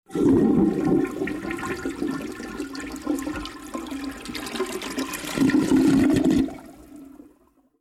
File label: Toilet flush running water